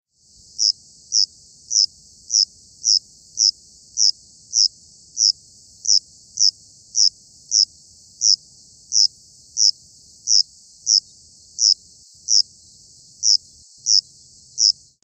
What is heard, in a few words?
tanbo koorogi